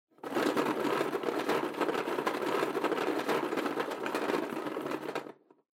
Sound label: Drawing Box, Rustle